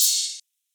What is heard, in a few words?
Open Hihat; Cymatics; Humble Hip Hop Sample Pack; Cymbals